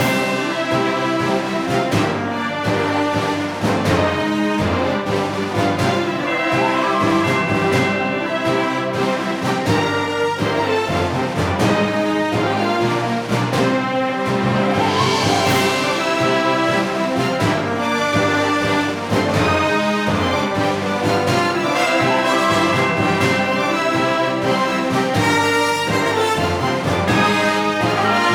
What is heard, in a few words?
OverWorld loop; PerituneMaterial OverWorld Remastered